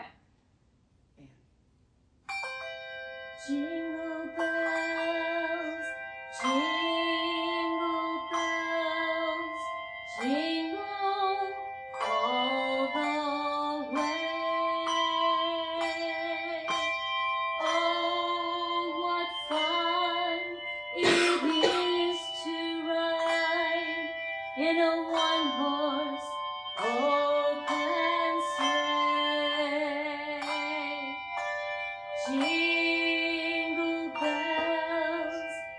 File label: Jingle Bells Jazz chords, handbell